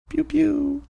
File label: quiet pew pew